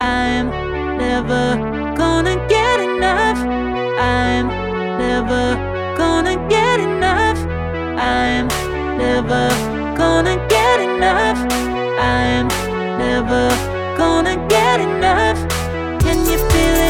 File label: electric pop mix